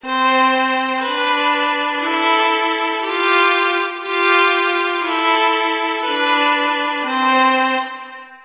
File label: slower